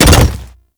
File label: Pet Land; Alad V